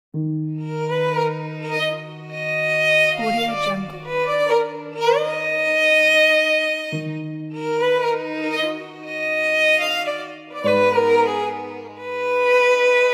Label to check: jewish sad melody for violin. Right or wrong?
Right